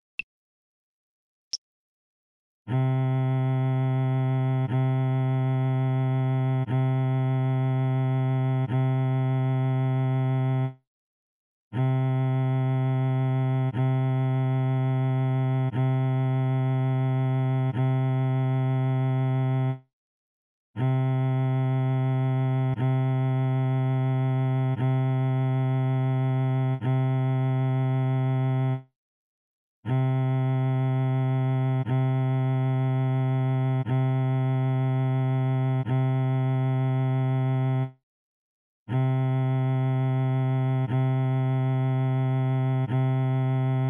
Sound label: c violin